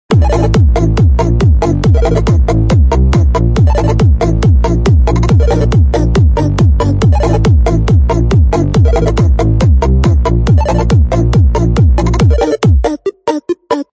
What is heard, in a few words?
phonk cowbell drift phonk; Phonk Ringtone